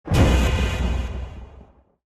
render complete; Business skieme Beta 1

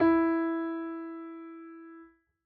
piano e; Golden Crayon Sounds

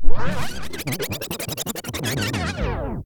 lose; RS Games Sounds; Farkle

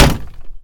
wall wood; Golden Crayon Sounds

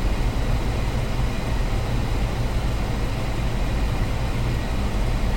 air conditioner; Golden Crayon Sounds

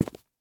marble step; Golden Crayon Sounds

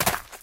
gravel land; Golden Crayon Sounds

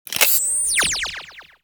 missile interceptor fire; Original TK Sounds

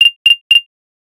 accessory locate Sound; iOS 26 Sounds

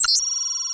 remote time bomb code; Original TK Sounds

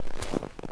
snow step; Golden Crayon Sounds

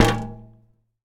wall glass cabinet; Golden Crayon Sounds

